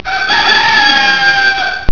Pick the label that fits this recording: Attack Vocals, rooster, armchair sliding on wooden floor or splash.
rooster